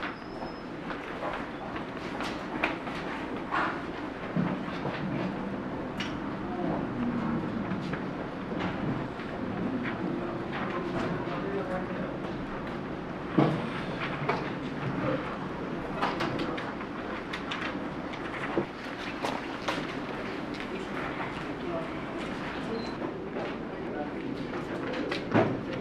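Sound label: office chatter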